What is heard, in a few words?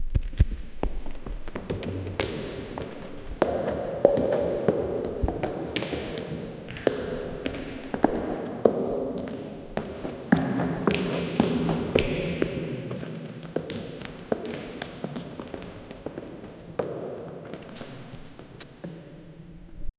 soft footstep echo in a, reverberant